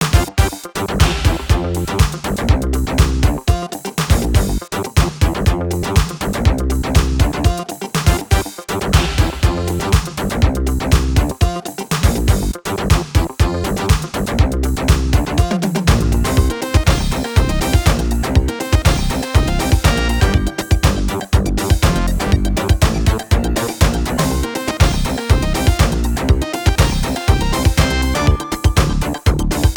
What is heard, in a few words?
Layer 3, 121 bpm; fashion police squad beta